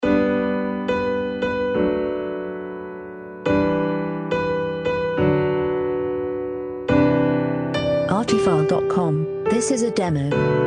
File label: jingle bells jazz; jazz version